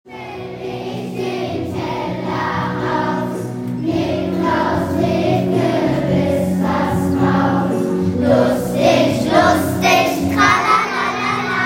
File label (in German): Nikolaus Gesang